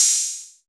Open hi hat

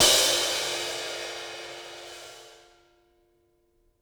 Sizzle crash, Drums Cymbals Crash

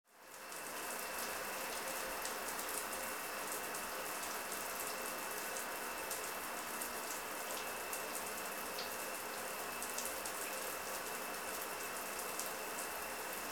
Shower running

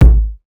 Asian kick, Kick drums

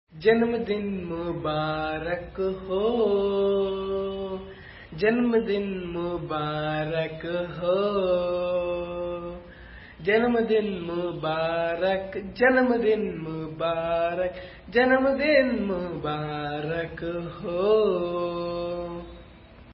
Happy Birthday Song in Hindi

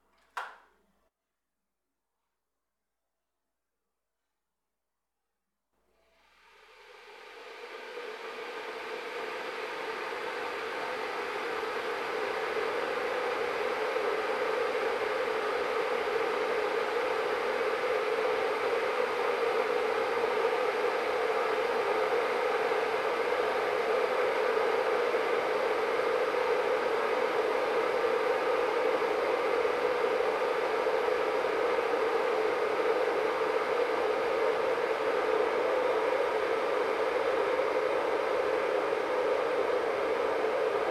Kettle boiling

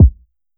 Dump kick, Kick drums

